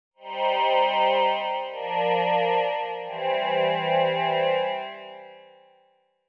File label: vocal